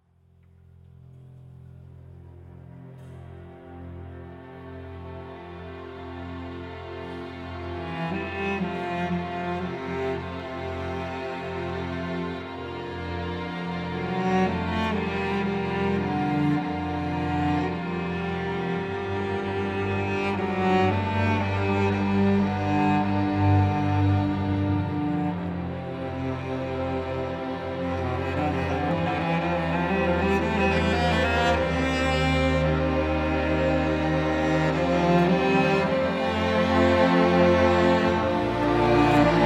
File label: Instrumental